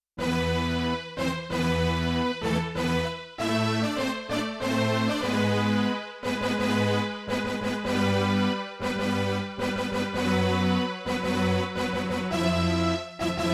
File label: Orchestral, Yamaha TX16W; Orchestral Performance 1